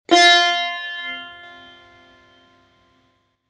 tar fa

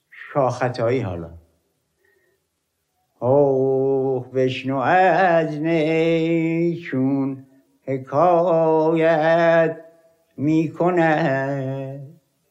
shah khataii, nava

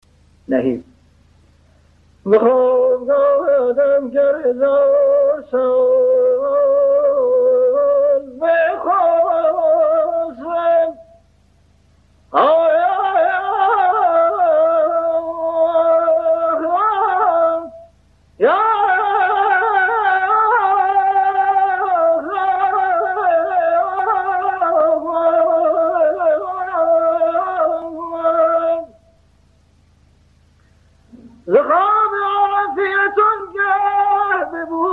nahib, afshari

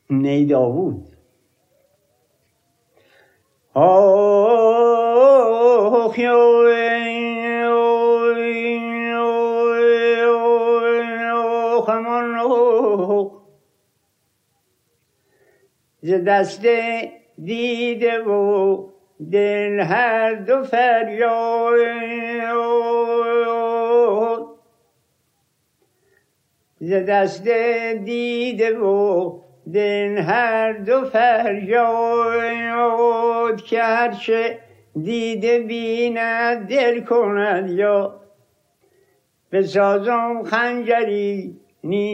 ney; homayoun

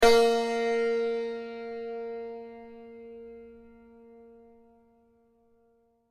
santor si

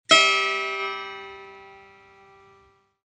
setar mi

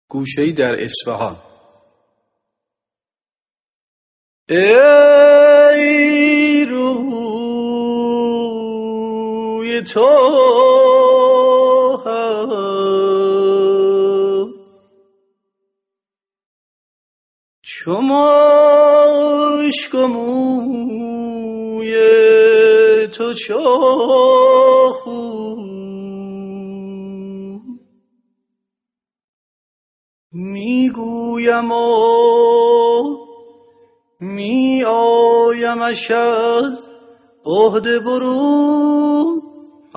ghoshe, bayate esfahan

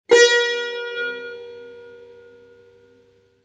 tar si

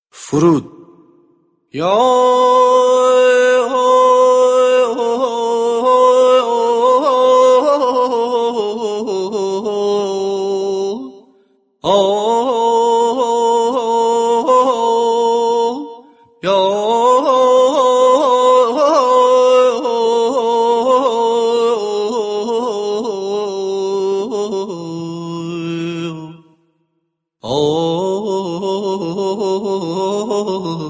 foorood; chaharghah

